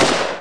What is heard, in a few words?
Knight SR25, karabiny snajperki; bron reczna